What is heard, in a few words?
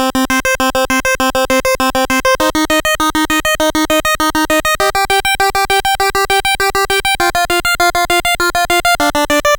NES Synth FX, Nintendo Entertainment System, chiptune; Sonic Foundry